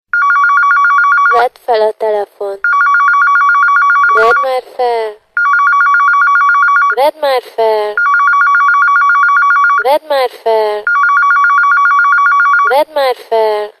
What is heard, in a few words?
Ringtone; nokia